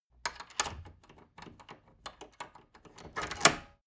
wood door old lock turn key long

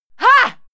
female fight attack hit; schreie; menschen

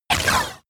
sci-fi laser shot short; krieg waffen

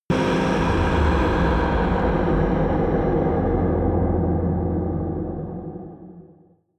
air blast

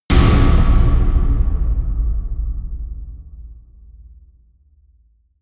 huge rim, percussion

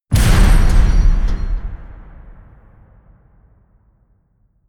promo pad; whooshes rises; hits impacts

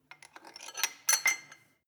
weight bolt insert, fitnessstudio

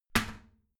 toilet seat lid slam heavy; haus-garten

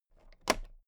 toolbox latch open soft; industrie wirtschaft; handwerk

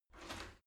shower slide door slide short open

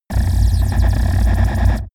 space laser blaster massive; krieg-waffen; soundpool

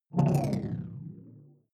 sci-fi alien big bionics; swooshes-swishes

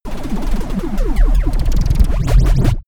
space laser blaster cannon damped; krieg-waffen; soundpool

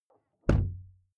car door close interior hummer h3; Cars

